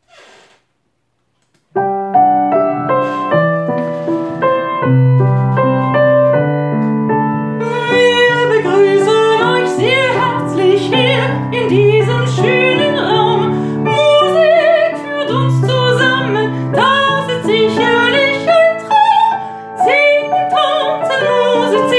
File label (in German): Gesang mit Klavierbegleitung